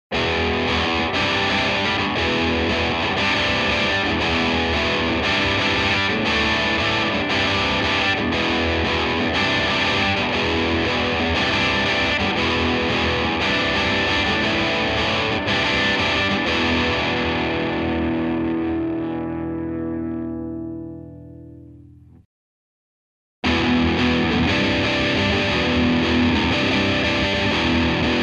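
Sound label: EMG High gain, 7string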